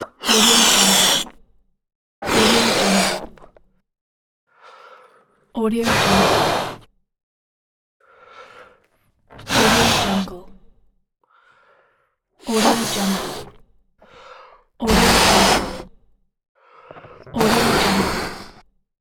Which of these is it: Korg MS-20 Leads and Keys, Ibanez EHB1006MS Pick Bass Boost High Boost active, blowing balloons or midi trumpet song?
blowing balloons